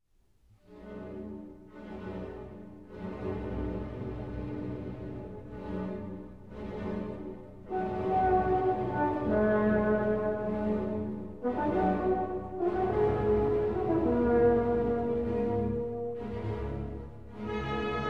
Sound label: Symphony No. 7; Night Song first movement (Mahler); Legend of Galactic Heroes; Galactic Empire SIDE